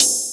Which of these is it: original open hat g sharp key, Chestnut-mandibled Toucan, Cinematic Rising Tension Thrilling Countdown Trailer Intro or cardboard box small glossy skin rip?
original open hat g sharp key